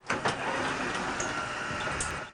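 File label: bus door close, volvo 9900; Freyfurt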